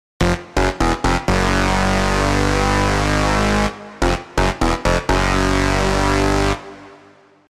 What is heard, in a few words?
Lead; PatchFactory